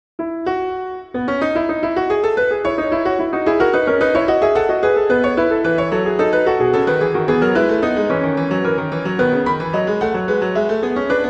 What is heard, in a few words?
Fugue; Bach; Prelude Fugue IX